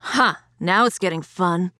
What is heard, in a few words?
Taking Damage; Tyra; Paladins